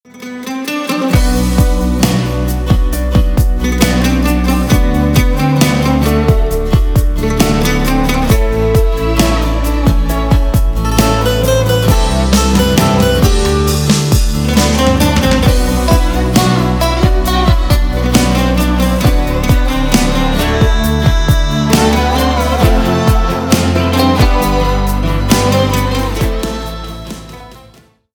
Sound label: instrumental